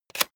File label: plastic device handling clack push